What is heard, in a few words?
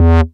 Bass Multi Rev E; Vengeance Samples